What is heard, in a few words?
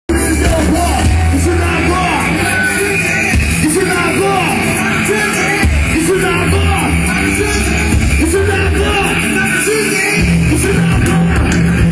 midi